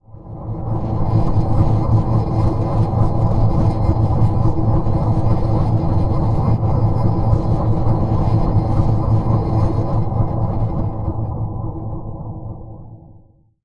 Tomb Drone A; Zero-G Seismic Frequencies; Ancient Drones; 90 s Sample CDs

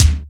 JD800 Dance Card Drums

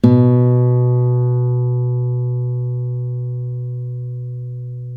ACC FG B, Acoustic Guitar; East West; Steve Stevens Guitar Samples Collection

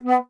Alto Flute

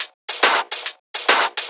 Shuffled Loops, 140 BPM

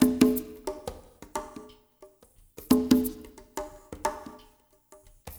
PERCMX; Spectrasonics; Liquid Grooves 1 & 2

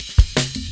Jazz Ride A Cut, Drum Loops, 165.5; Zero-G Jungle Warfare 1, 2 & 3; 90 s Sample CDs

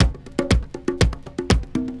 120 HOUSE, drums

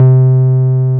303 SUB C4F, SUB BASS

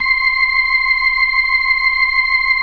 C6F, B3

